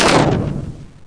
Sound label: Kachunk; 3D Ultra Pinball Demo